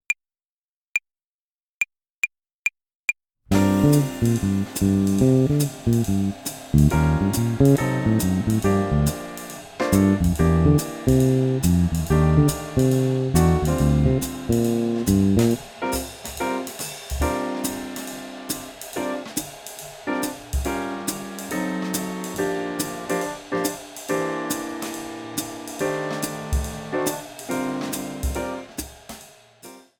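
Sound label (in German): Swing Phrasierung Übung; play along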